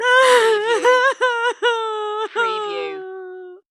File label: Female Sob